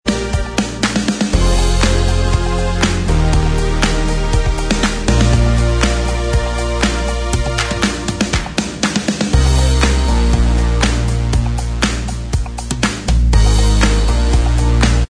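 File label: instrumental, backing track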